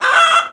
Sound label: death; opentdm; chicken